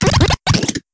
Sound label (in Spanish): Grito de Grubbin